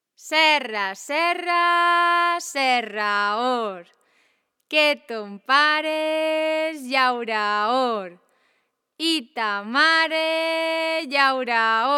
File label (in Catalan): Cançó infantil; Serra serra serrador; Titana